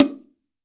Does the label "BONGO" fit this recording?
Yes